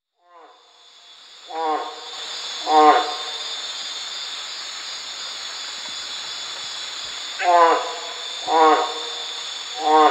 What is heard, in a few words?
Phrynohyas resinifictrix